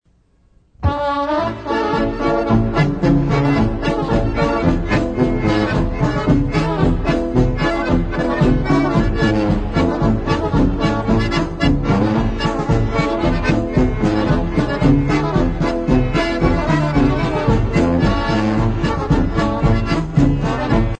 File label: GRASSHOPPER POLKA